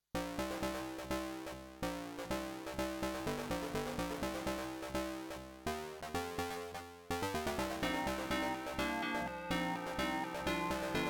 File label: intro, cmedia8328 sam9233 opl; Soundcard test page; Laser Squad